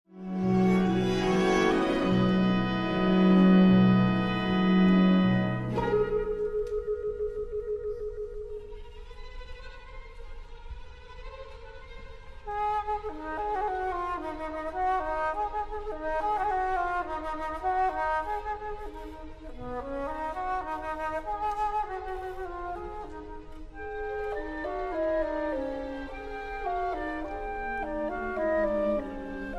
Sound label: Alto flute; فلوت آلتو